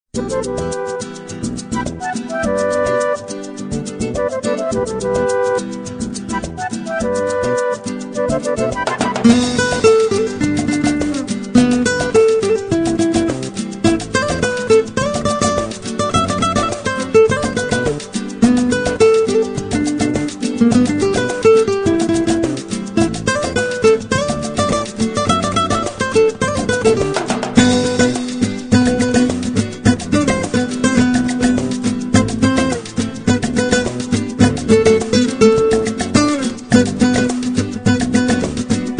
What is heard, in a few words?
Jingle Bells